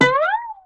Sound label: Guitar Plink Slide